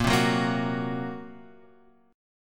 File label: A sharp Suspended 2nd Sharp 5th A sharp